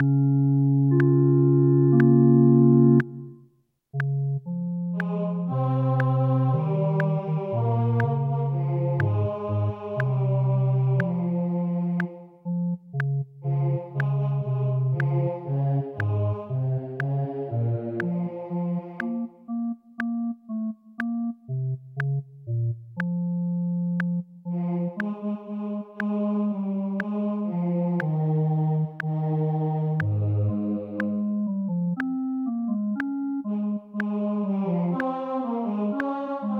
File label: O clap your hands; Gibbons